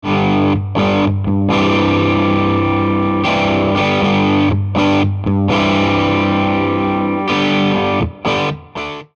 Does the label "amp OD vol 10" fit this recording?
Yes